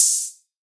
Winter Open Hihat; trap; Hihats; Cymatics; Trap Starter Pack

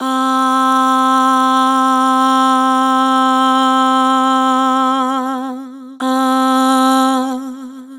Vibrato "Aah", Vocals; Harmor Vocals

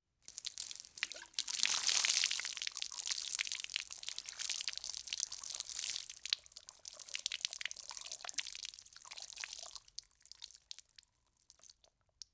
Water Pouring and Spilling; Fairlight Sound Lib

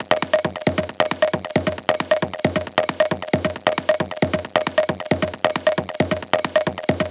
Perc Loop 135, Techno

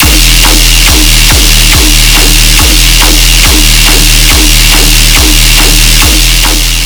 Bass Loop D# 140BPM, Dubstep; Grim Reaper's Anthem

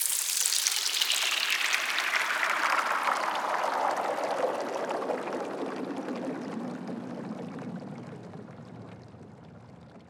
Downlifter Water; One-Shots; SFX; Ghosthack; Color Bass Essentials

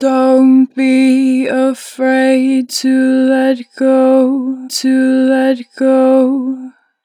Don't Be, 4 bar vib, Vocals, Natural Vibrato; Harmor Vocals

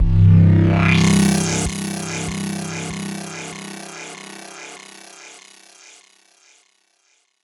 Pink Width Modulation Zap C 145 BPM, Psytrance; Zaps & Filter Sweeps; Ghosthack; Psytrance Visions Volume 2

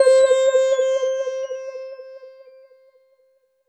LEAD Formant - C; Synth One Shots

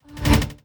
Jolt Standard

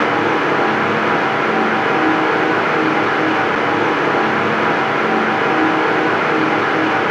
Texture Loop, Techno

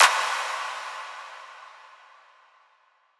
Impact Clapper; One-Shots; SFX; Ghosthack; Color Bass Essentials

